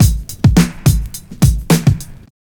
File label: house loop